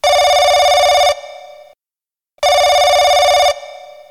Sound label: telephone ring